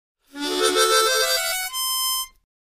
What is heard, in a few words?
Mouth Organ Vibrato, harmonica; woodwinds